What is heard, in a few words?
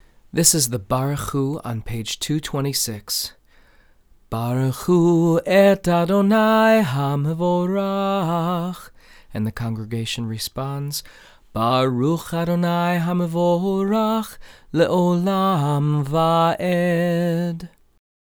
Barchu